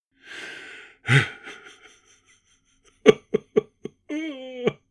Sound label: Senior male crying